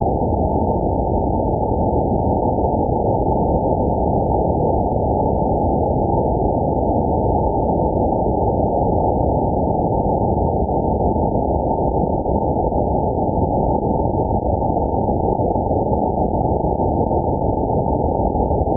Drone Underwater Slow

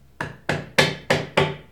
Wooden Hammering

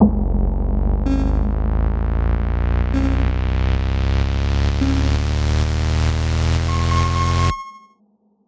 4 bars Build Up

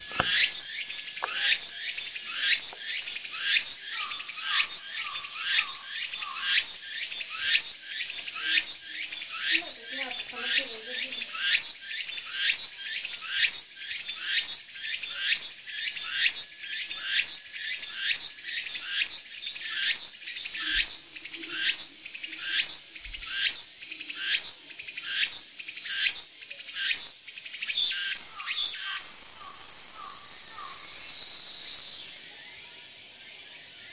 Tsukutsukuboshi Cicadas Kyoto

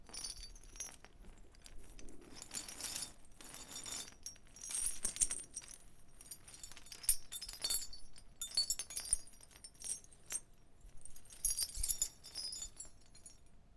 Other Chains

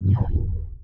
Whoosh Laser Zap

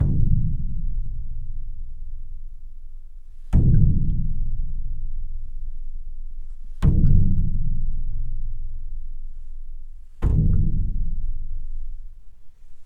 Hitting A Water Jug

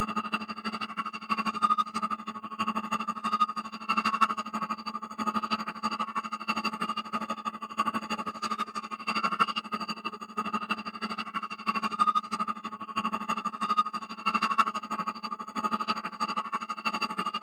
Whistling 16ths

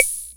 Clave

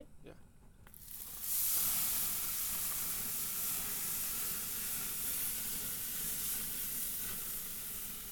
Tri Tip On Bbq

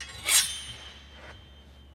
Sword Slice

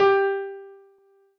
Piano Ff

